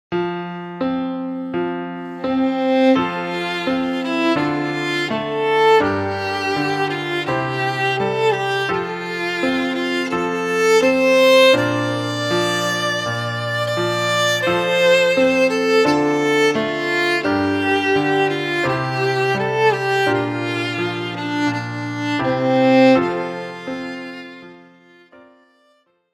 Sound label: Auld Lang Syne (Traditional); Aus der musikalischen Schatzkiste 1 - Violine